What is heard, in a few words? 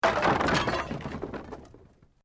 Wood Collapse